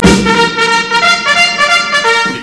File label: Trumpet To Attak; psion cd vol1